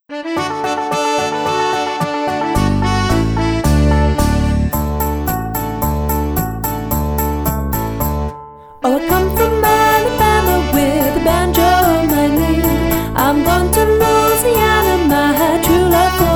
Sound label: Oh Susanna, Vocals; Singing Bell